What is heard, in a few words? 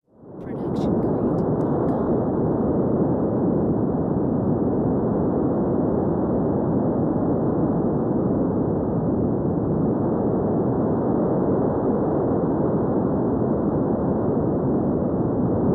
wind bassy; soundscrate